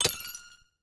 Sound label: wither beast hurt